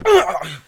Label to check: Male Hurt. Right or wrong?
Right